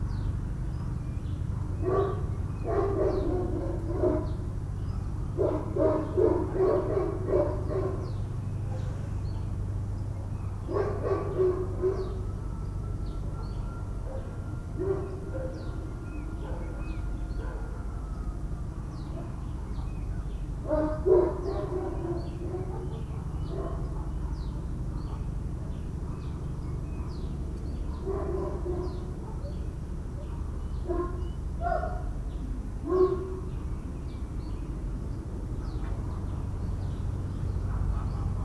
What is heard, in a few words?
Suburban morning dogs birds; urban and suburban; boom library